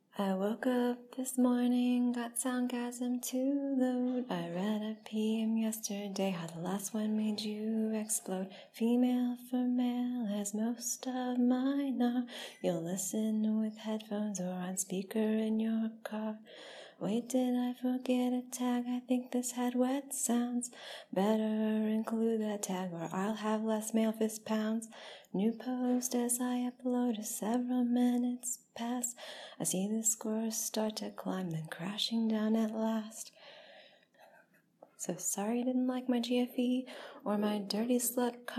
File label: Singing, acapella; F4A